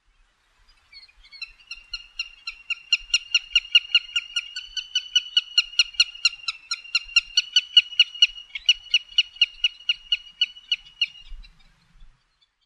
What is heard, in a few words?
willet